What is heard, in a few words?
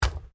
ORGANIC STAB WET; Various Organic Foley sounds; Stabs and Slices; Combat